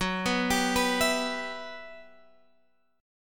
E Minor F sharp, chord; Em/F#; arpeggiated

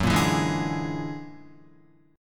Gb Augmented 9th Gb down Guitar 4F,4A,5C,5G,5C,6E; Gb+9 strummed

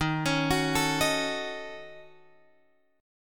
Eb Minor 7th Flat 5th, down, Guitar, Open F; arpeggiated

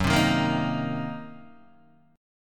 Gb 6th Flat 5th Gb down Guitar DADGAD

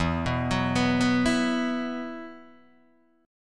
E Suspended 2nd, Guitar Open D

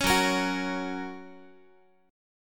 Gb Major, Guitar; Listen to Gb strummed

